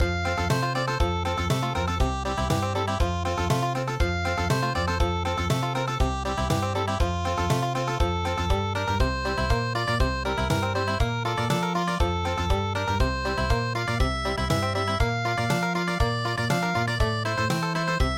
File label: Country, midi traditional